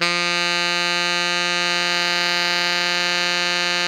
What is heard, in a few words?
TENOR F 3, Saxophones; Greytsounds; Philip Wolfe David Crozier's Saxophones